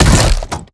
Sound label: Drone Vulcan Attack Start; blue archive